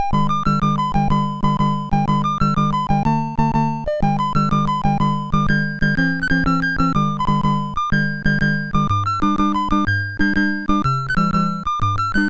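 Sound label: Maxi Golf, MOS 6581 SID chip music, games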